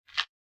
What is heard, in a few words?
footfall gravel step